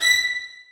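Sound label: 2nd violins staccato a#3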